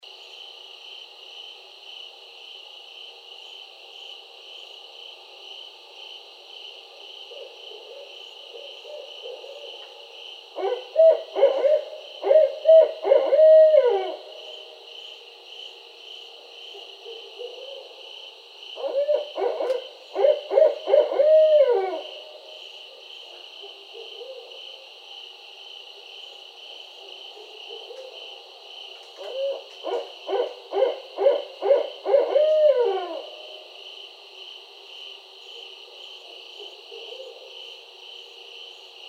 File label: Barred Owl